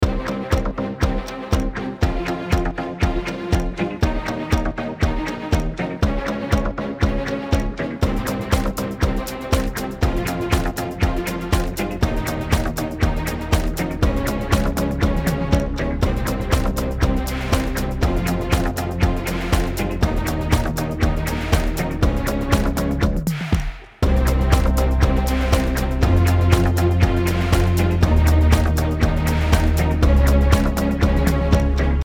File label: LOOP STRINGS